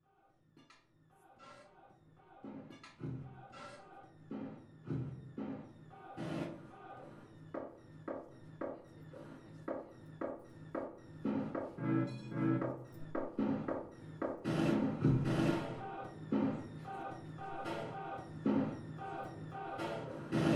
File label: after bach cello suite; soundscapesrostock